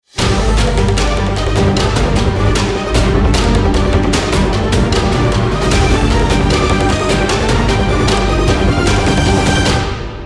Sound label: bomb ten sec count; CS2; Counter Strike